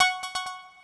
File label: beep synth tone